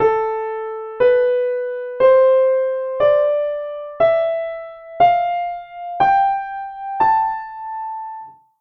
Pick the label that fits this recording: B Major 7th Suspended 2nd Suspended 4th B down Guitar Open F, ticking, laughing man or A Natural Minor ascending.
A Natural Minor ascending